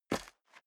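step dress boots concrete grit single stair up, footsteps; schritte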